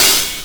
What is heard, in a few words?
HH OPEN